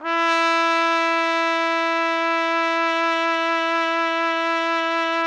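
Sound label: trumpet; Roland - LA Composer vol. 3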